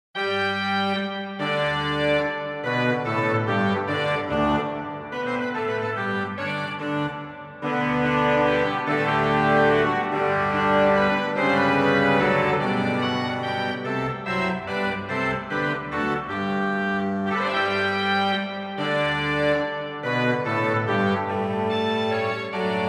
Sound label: Grand Choeur; Beauvarlet Charpentier JJ Op13 Messe Solm